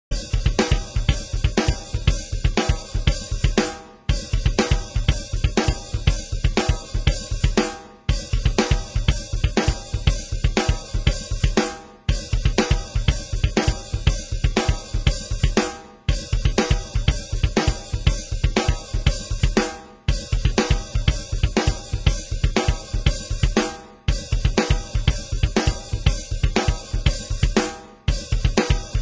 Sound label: drums